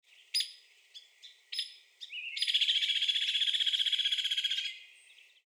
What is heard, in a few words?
Hairy Woodpecker